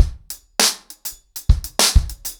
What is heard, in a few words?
100BPM, ACOUSTIC DRUMS